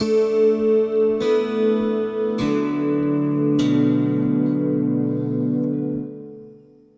Kantele Cykl 5th, Acoustic String; Sonic Foundry (Sony Creative Software); Friendly Fire

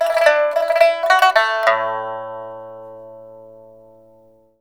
PIPA PHRASES; Spectrasonics; Heart of Asia

